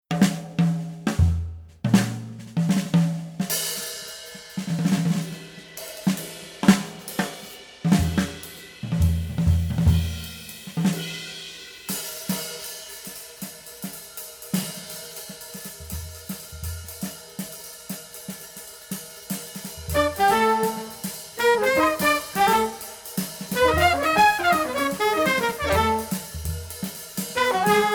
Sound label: Blues